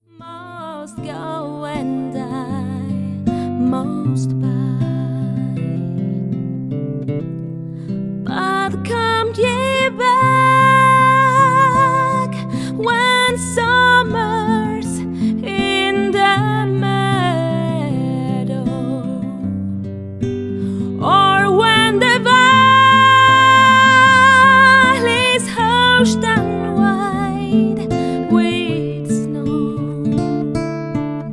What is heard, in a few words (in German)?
Gesang song